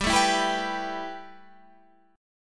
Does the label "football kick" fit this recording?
No